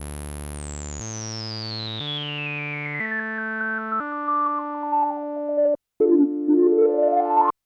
MODOR NF-1 Filter Quantize

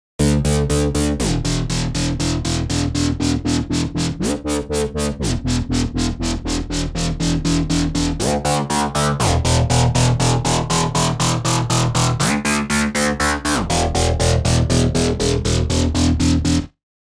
Rapid Sync Sequence; Parawave

